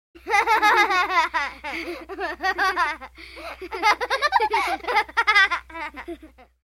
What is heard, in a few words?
Giggling Two Children Close Perspective; Human Sounds